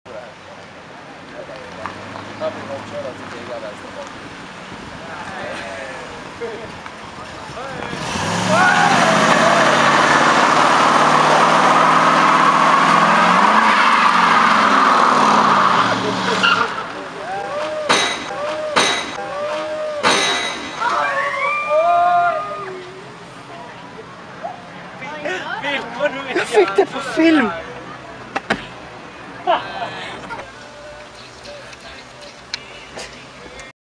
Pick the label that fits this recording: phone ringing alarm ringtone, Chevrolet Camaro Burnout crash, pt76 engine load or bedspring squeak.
Chevrolet Camaro Burnout crash